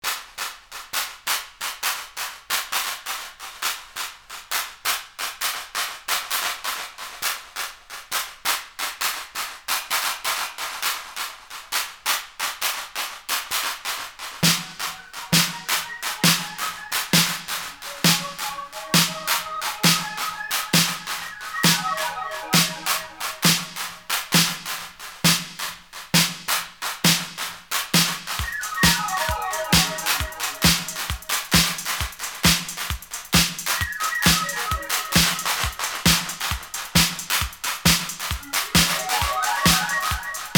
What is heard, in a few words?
Street Clap